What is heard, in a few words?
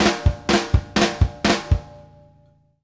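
snare + kick, drum fills; Sampleheads - New York City Drumworks VOL-1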